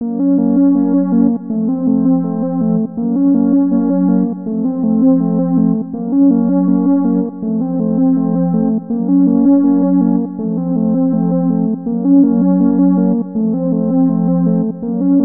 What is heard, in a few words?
sample synth